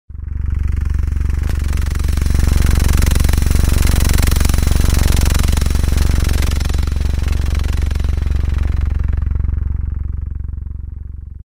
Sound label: Chopper; Alesis airSynth